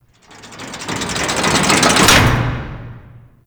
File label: Defense Activate Metal Gear Buildup; Ambience; Gameplay; Warframe; Entrati